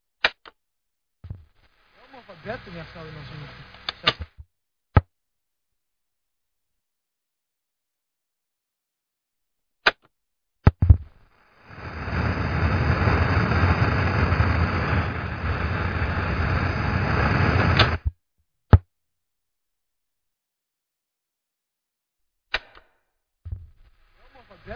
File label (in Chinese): 电视音效; At Home Alone Final Online